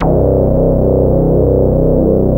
ODY, ARP Odyssey

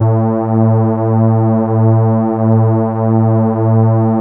ODY PAD, ARP Odyssey

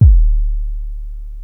kick, Chroma Polaris

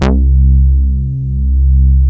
ARP Odyssey

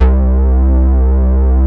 JX3 PWM, Roland JX

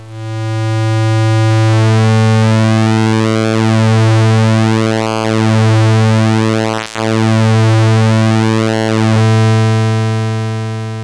PWM, Siel DK-600

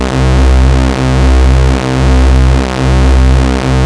STRING, Siel DK-600

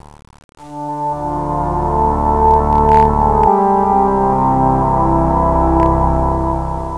EVIL PAD, Siel DK-600

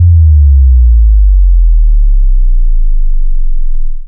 kicks, drum machines; deepsky

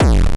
grunge, kicks; drum-machines; deepsky

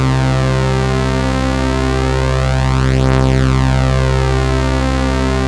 SAW HAR, Siel DK-600

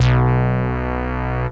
Casio CZ BASS

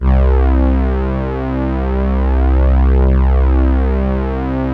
Roland JX3 STR2

